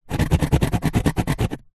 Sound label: foley cardboard scratch sharp object